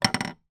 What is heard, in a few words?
toy wooden hammer down on surface